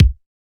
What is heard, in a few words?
Electro kick; Reference Beat